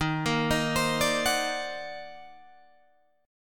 Eb Minor Major 13th, Guitar, DADGAD; EbmM13; arpeggiated

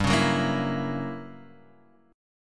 F sharp 7th G, chord; strummed; JGuitar

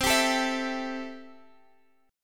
C 6th C down Guitar Drop D

